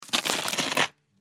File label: Toast butter spread butter knife